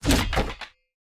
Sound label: construction repair